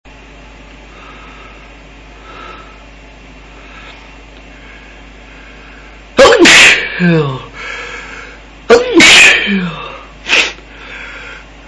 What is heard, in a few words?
3 sneezes, male